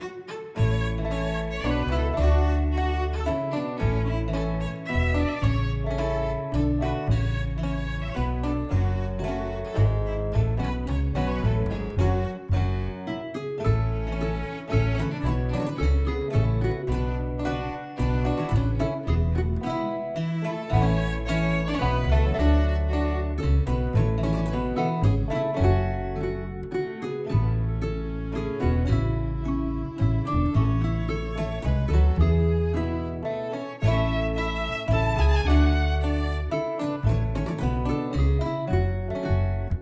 menu music background music; Talent Not Included; macOS Windows Xbox One

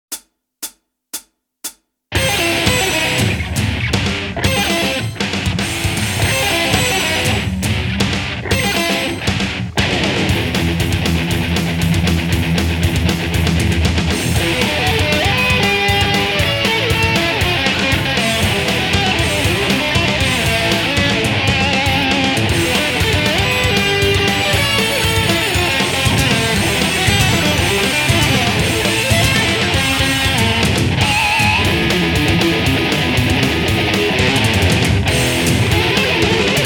Bass Minus Track

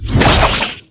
Skill Pet Scratch

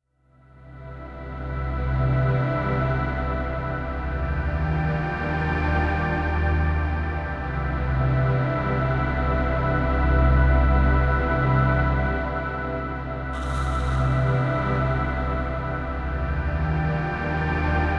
Space DnB

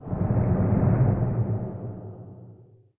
Dark; The Denpa Men; Android

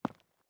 Footstep Concrete Walking; gamerip; The Looker

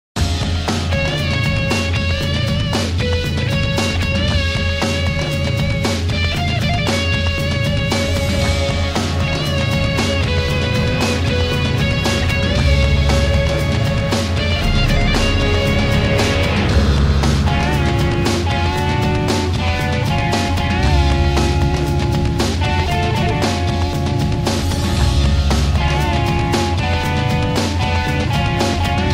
Fight Groove; Afro Samurai The Game OST; PS3; Xbox 360

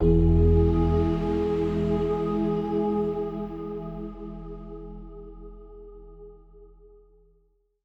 Stingers sting positive

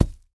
metal object drop dirt; a dump in the dark

